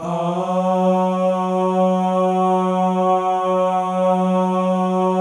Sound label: MALE AHS; Spectrasonics; Symphony of Voices